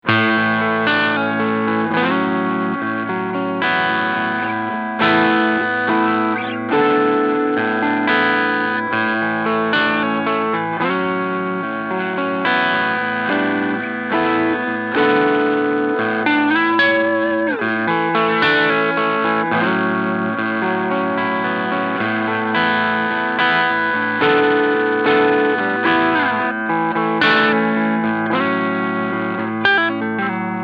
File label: Filtersweep Full